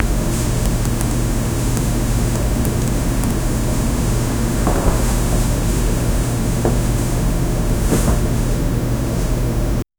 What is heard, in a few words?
silent chapel room; interior